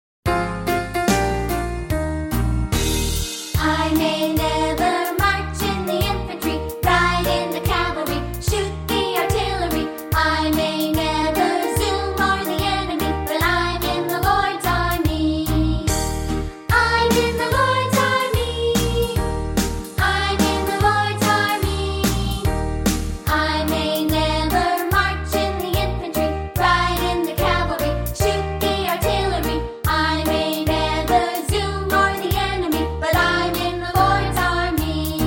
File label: Childrens Choir